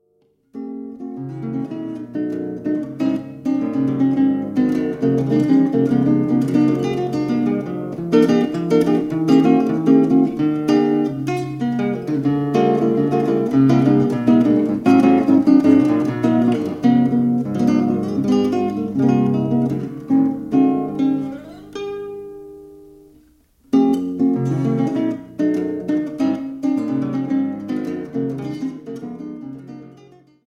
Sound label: Classical Guitar; Villa-Lobos